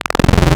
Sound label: PWM, Analog; PWM Maths; Xfer Records Serum; Serum Presets